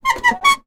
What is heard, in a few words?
metal handle movement squeak rusty old; zapsplat